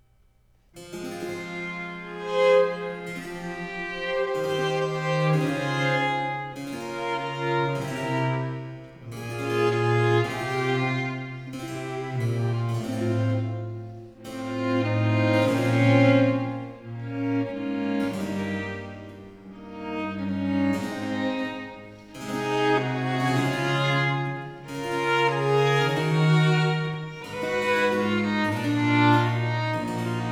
Ayres for the Violin, Suite in A Major, Serio - Adagio; Book 4; Italian Baroque Violin Sonatas; Nicola Matteis; Ayres for the Violin, Vol.1; 尼古拉斯 · 麦克吉根